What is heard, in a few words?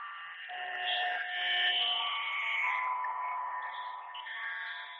compost debris; marie-eve-levasseur